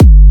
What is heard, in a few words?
Kick G full note